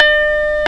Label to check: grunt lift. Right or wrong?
Wrong